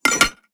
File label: canopic jar close; skyrim